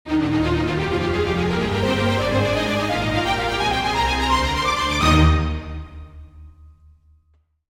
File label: Orchestral entrance